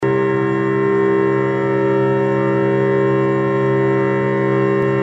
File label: microtonal variations of the all-interval-chord; akkord 6 c1 g1 e0 b- cis-1 fis-1